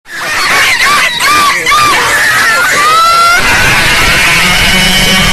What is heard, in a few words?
laugh scream; fnaf